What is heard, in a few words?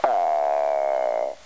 spadeft